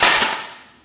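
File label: physics clipboard impact large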